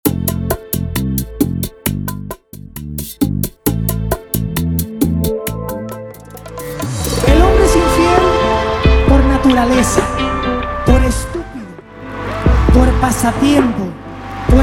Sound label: Intro Live Outro 133BPM